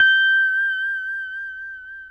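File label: pedal steel G6 right; PEDSTL NOTES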